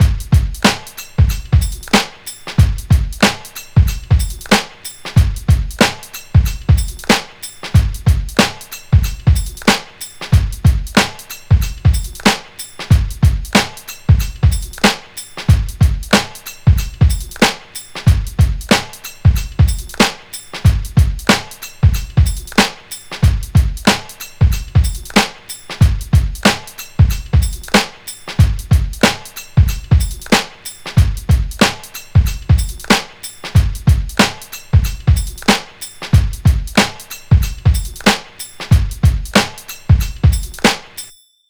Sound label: 93 Bellyfunk, Breaks; Lockdown Breaks